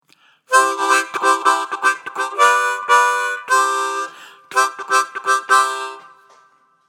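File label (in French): impro solo harmonica; pour les petits; loto sonore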